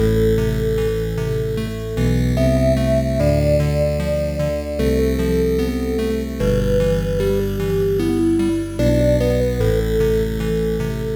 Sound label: Music - BGM; fushigi no umi no nadia the secret of blue water; pc engine cd; turbografx 16